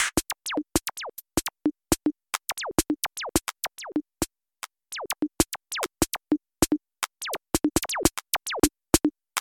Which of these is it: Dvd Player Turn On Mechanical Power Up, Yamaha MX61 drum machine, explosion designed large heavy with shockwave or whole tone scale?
Yamaha MX61 drum machine